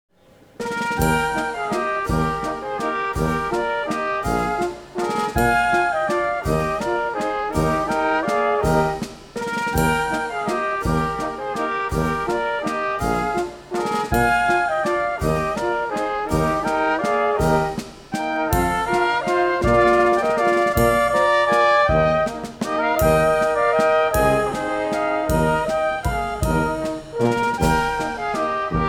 German Band

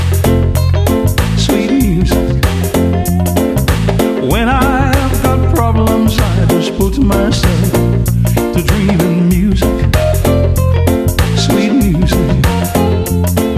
Singer Pianist; Orlando

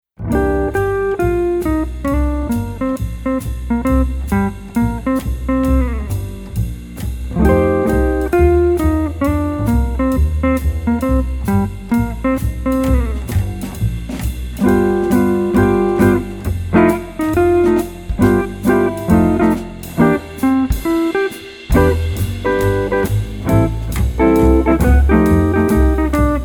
Jazz Band; Houston

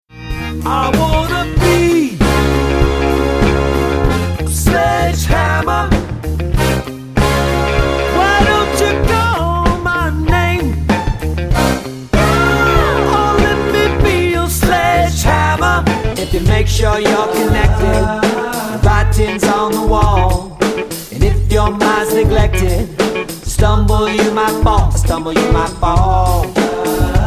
Dance Band dance Sampler; Sacramento

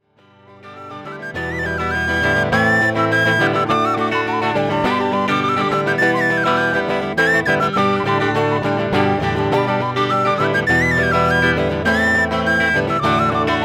Irish Band Whistle Guitar; Washington; St Paddys

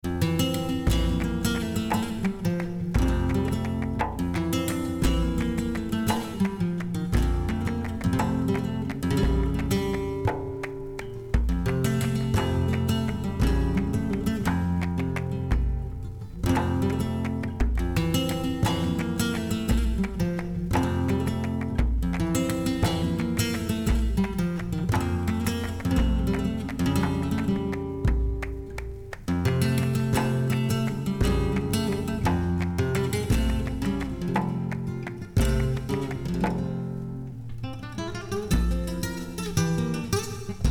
Flamenco Guitarist; Seville

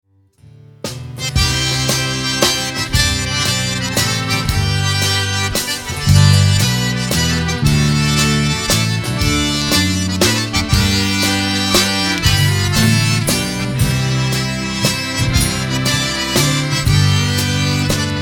Cajun Zydeco Band; Orlando

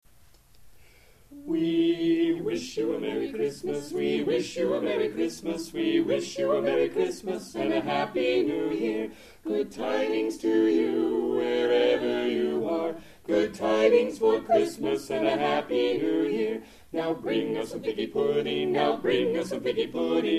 Carolers; Cleveland

